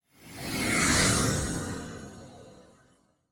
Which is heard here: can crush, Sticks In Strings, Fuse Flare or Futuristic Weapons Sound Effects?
Futuristic Weapons Sound Effects